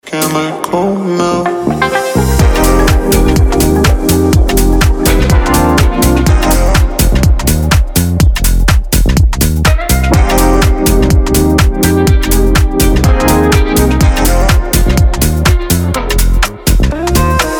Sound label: EDM